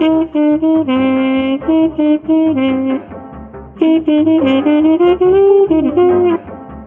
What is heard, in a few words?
horns, loops